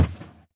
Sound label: M Bed Pound; Lewd SFX